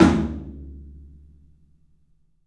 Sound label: TOM, Low Toms, 3D Percussives; E-MU Producer Series Vol. 5 – 3-D Audio Collection